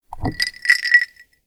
Pick up the glass with ice in it

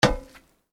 Footsteps Iron plate One

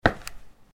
Footsteps Concrete Asphalt Street

